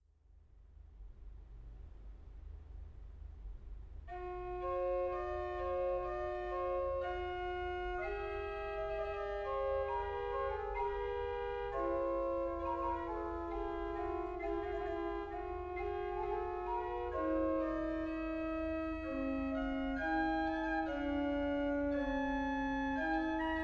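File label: Himno a 3, organ; Caimari; Mallorca; Cabezon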